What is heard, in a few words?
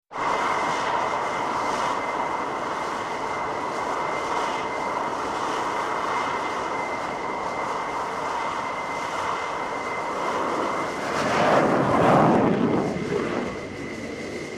Catapult take off of jet fighter from aircraft carrier